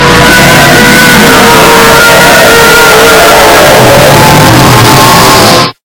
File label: tie fighter roar loud